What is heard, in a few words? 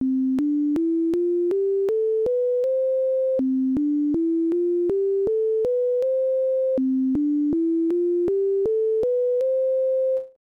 Speaker Check; ehrgeiz; namco system 12 arcade